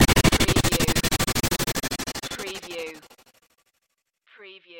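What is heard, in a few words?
Production Element Chopper; Sound Effects Bible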